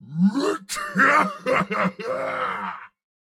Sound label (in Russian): Смех; Турбосвин